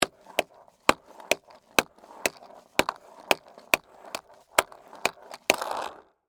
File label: Skateboard Walk, Mini, Movement, Cement, Surface; Sports; Skateboarding; BlastWave